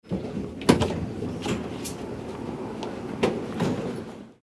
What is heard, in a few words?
underground door open